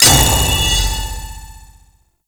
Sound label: Pre Death Start; Warframe; Duviri; Dax